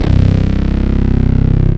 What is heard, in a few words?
BAS, Eurotech; Sounds Good